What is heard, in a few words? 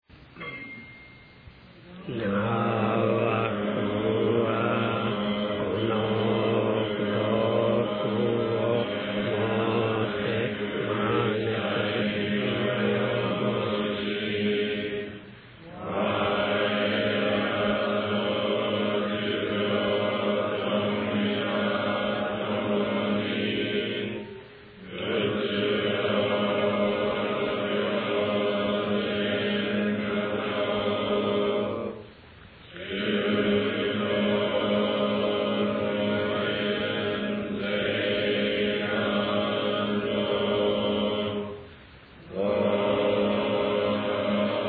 end chant